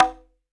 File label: CNG HOSL, ST CONGA; AKAI S-Series CD-ROM Sound Library VOL-5